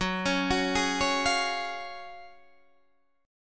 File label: Gb Major 7th Suspended 2nd, Guitar Standard; GbM7sus2 arpeggiated; JGuitar